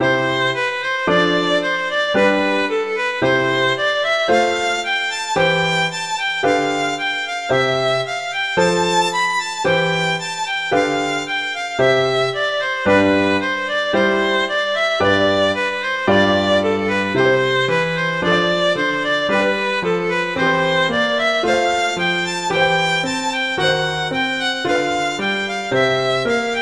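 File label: Allegretto, violin; Elgar; Melodious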